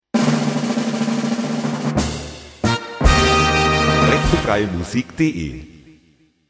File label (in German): Tusch tata Wirbel